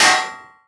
Genie lamp clang; 100rogues

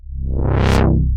Blackhole create; Megaplane

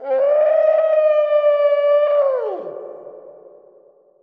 Dungeon screams; 100rogues

